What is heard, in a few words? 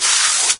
foot drag; doom3